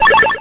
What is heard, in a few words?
Coin; Alien Challenge